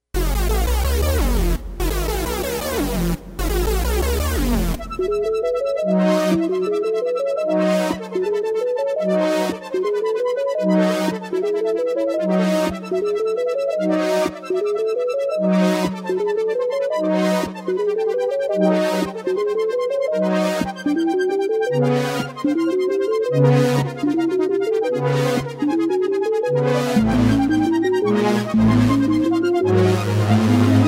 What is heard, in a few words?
korg synth; bartok